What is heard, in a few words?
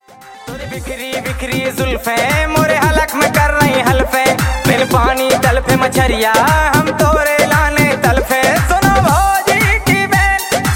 Ringtone